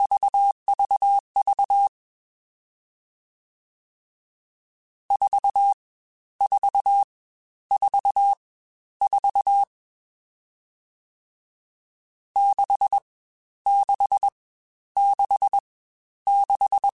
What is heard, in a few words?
CW Code Groups Mixed Short Runs Staccato; HamRadio